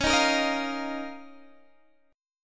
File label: D sharp 7th Suspended 2nd C sharp, Guitar